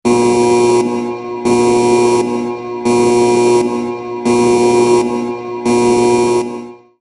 Crisis Alarm

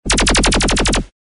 laser ripping burst, sci-fi

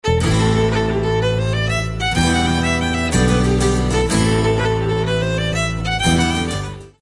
toe tapping jig

